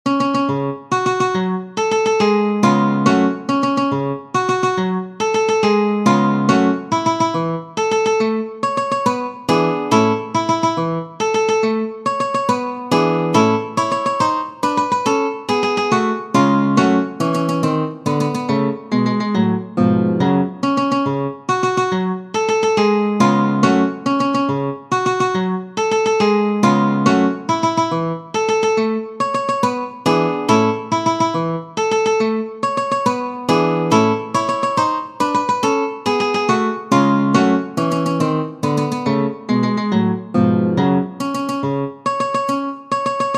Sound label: Tarantella, guitar